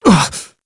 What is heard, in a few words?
augh; clive